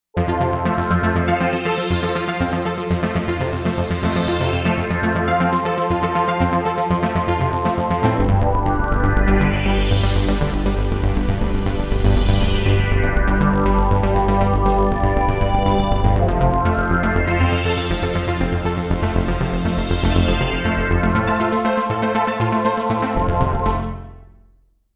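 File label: 80s Sequence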